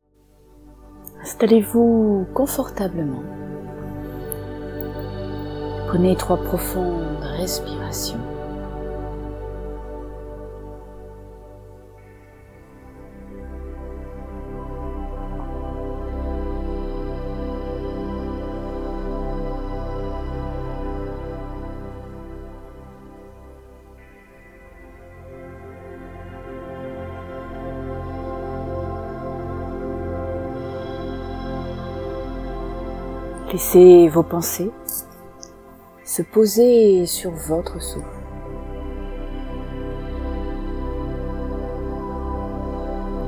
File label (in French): Rain musique; therapie ifs